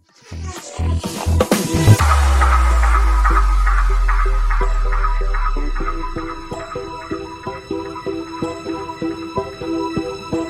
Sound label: Disko Funk Edit 126 Bpm